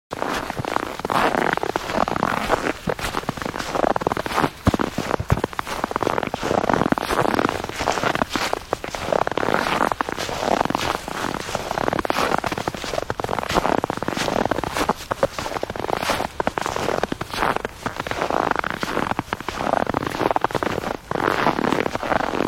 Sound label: Walking Through Snow, Sound of footsteps walking through soft snow; Human